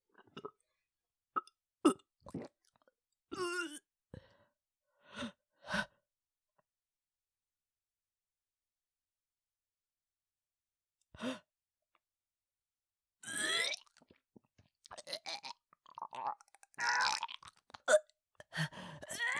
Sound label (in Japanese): 喘ぎ、むせ、嘔吐